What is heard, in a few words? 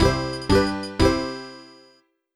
Jingle Comic Start